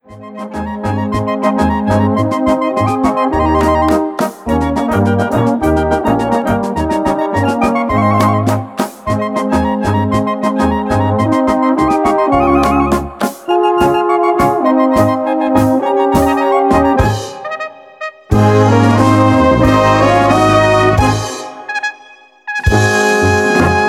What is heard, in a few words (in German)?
Blasmusik